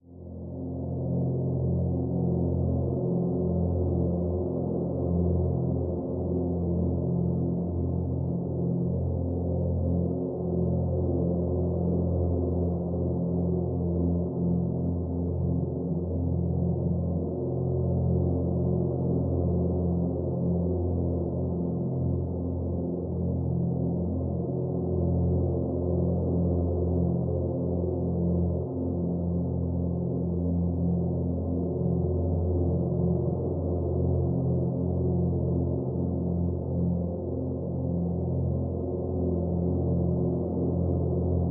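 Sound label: Drone Wind Farm Simple F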